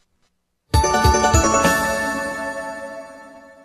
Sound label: level up